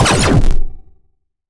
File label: Scifi Blaster